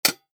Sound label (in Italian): suoneria botton; beep